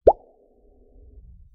Single Bubble Pop

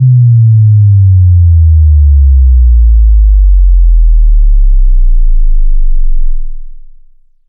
Subdrop

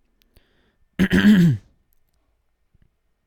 To Clear One S Throat

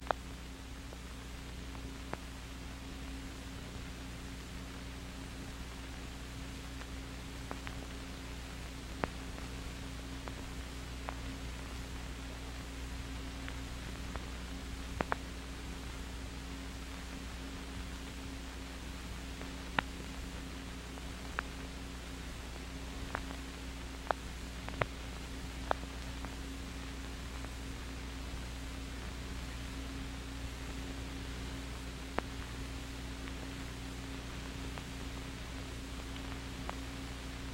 Soundtrack Noise 1940s

